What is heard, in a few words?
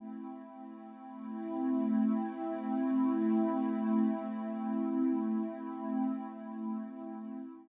SYNTH PAD ETHNO 125 A, Sphere